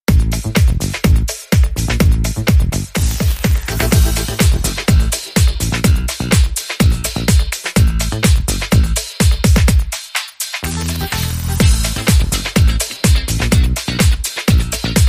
Sax It, Short Rhythm

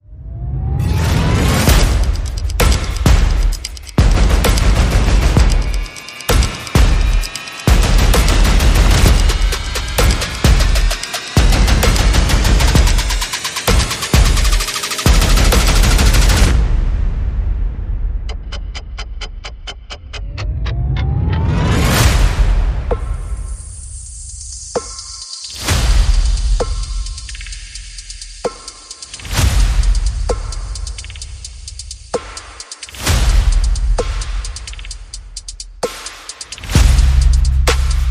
Percussion Bass and Effects

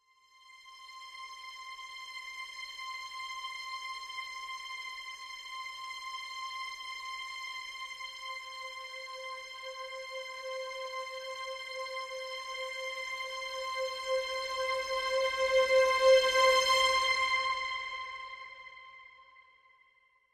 Simple String (C)

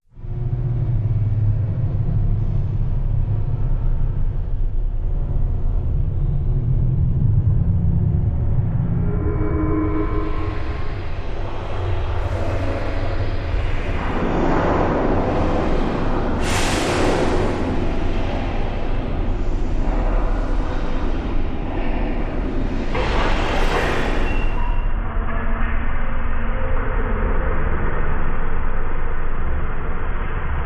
Devils Workshop Ambience

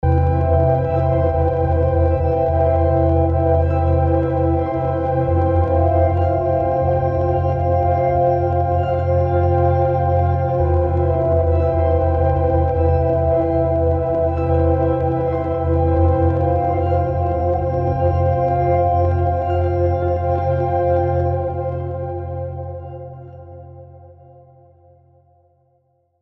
Wondrous Layer

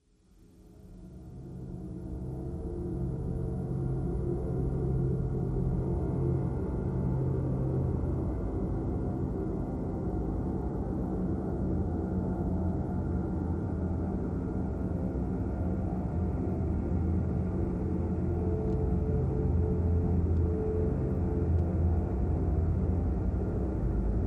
Drones Only

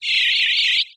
Chirpy Squealer

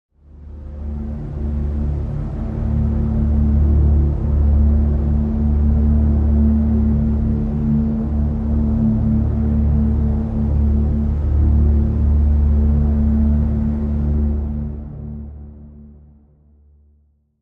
Faint Bowed Low Pad

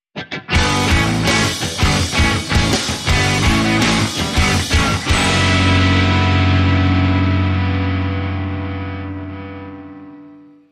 Rock Power Chords

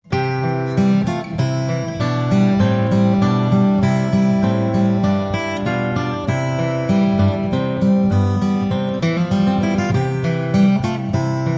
Deck The Halls, without Jingle Bells

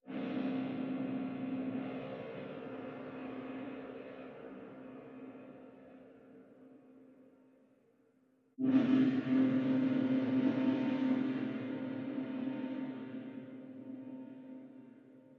Strings Only

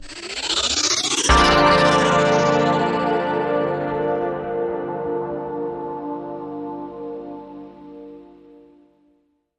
Sonic Vortex

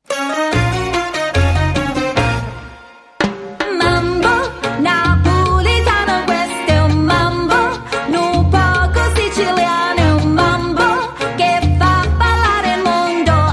Mambo Napoletano Female Voice

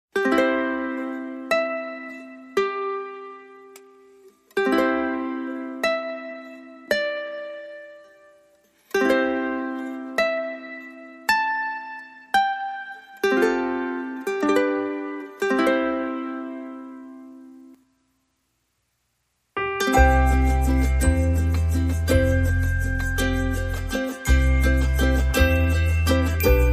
No Lead Acoustic Guitar

